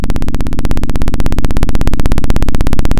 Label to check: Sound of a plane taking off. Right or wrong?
Right